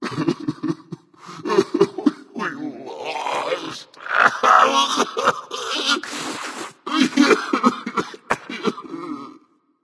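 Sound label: nonverbal boohoo; smite; voicepack; merlin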